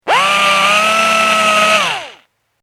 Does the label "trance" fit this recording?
No